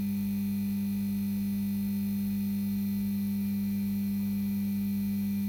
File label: Electricity, Buzz & Hum, Fluorescent Light, Buzz & Long, Soft, Power Up; Light Switch, On, Off